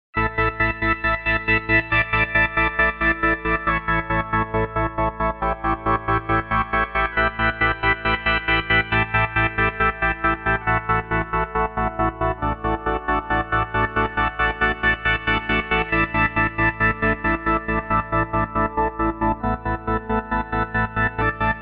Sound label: Organ